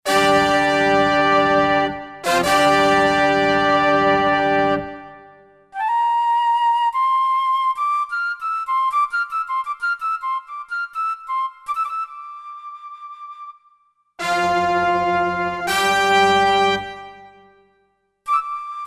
BRS. & FLUTE, Roland SC-880; Factory Patches

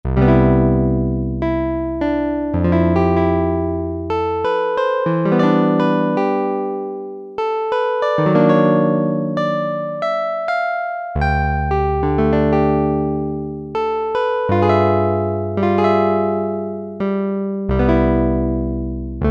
Piano 2, Roland Juno-60; Factory presets

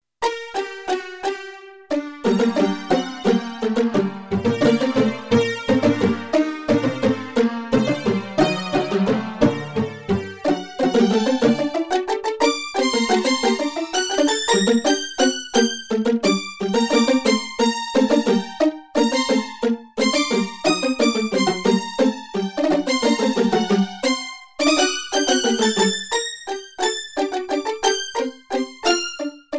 Strings

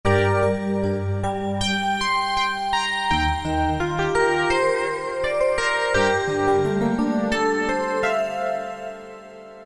Tremolo Brass Bells; Roland PN-D50-02

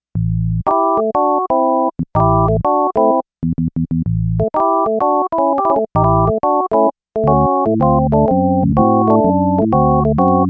E.Organ 2, Yamaha DX11; Factory Preset Voices

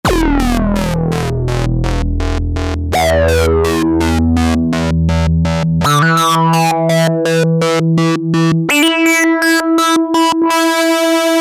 Sweeper train, synth

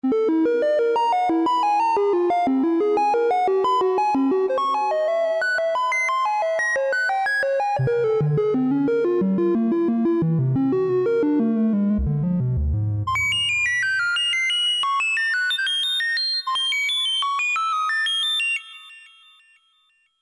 COMPUTER, Akai SG01v

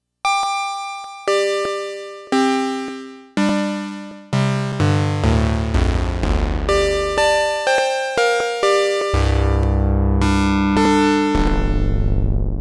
Long Chimes

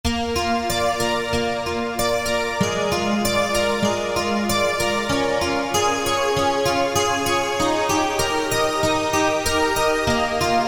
Guitar Pad, Alesis Z2 EuroDance

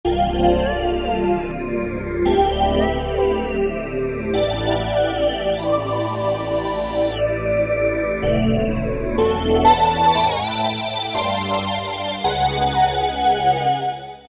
pad AmbiWind; E-mu Orbit V2; Factory presets

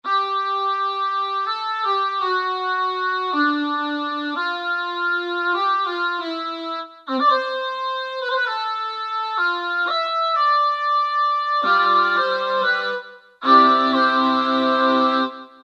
Korg microKORG Female Ahhh; Factory Voices

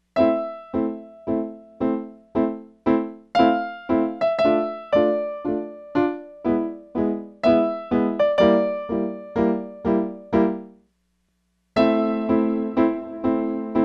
Concert Hall

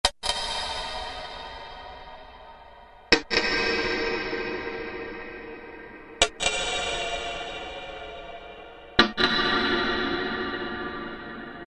Pluck, Korg M1; Factory preset examples

